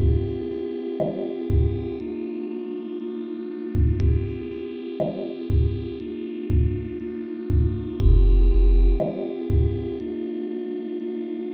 Sine Wave

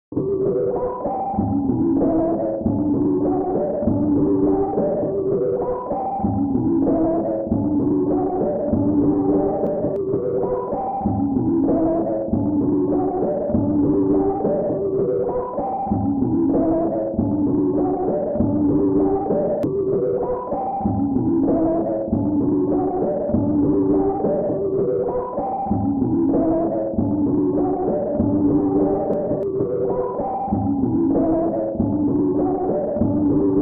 Sinewave

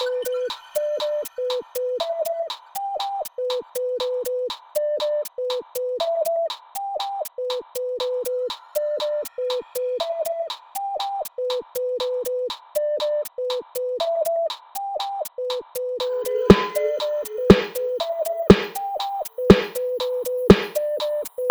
Sine Wave

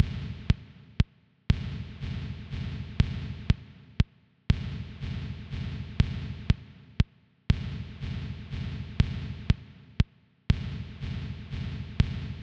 Sinewave

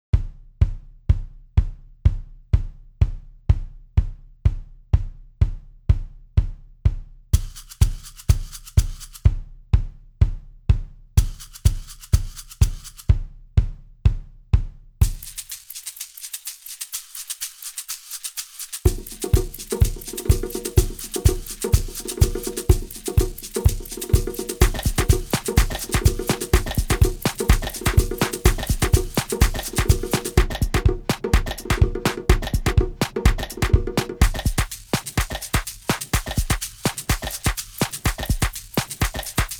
drums mixed

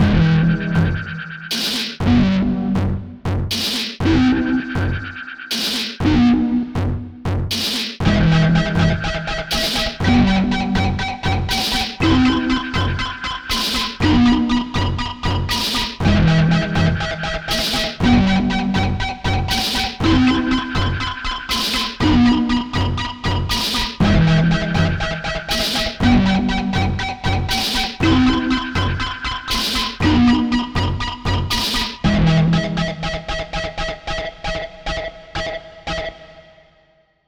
Sinewave

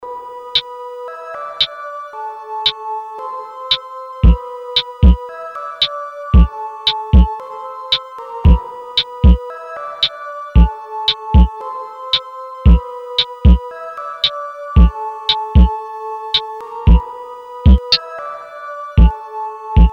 Sinewave

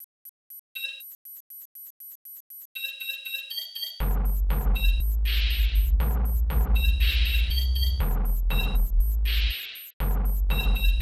Sine Wave

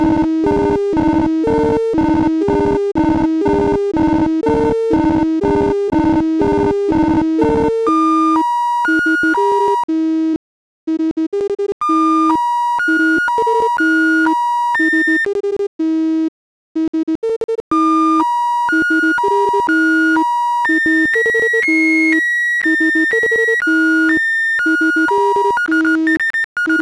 sine wave

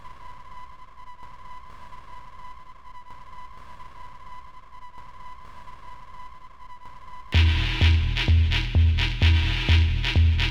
Sine Wave; AudioSuite

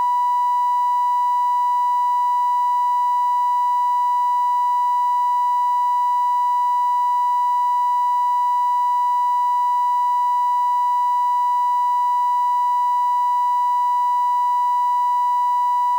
Sinewave

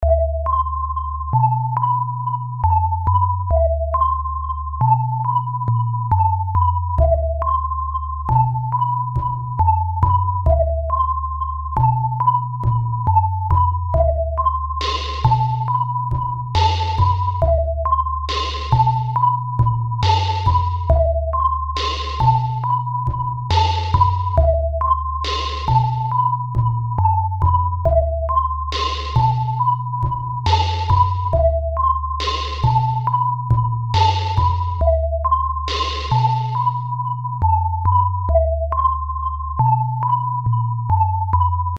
Beatz sinewave

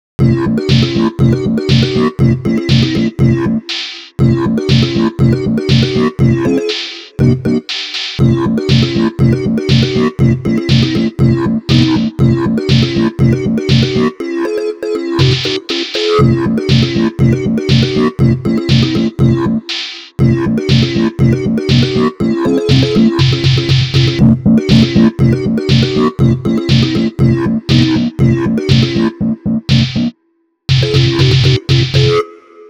sinewave

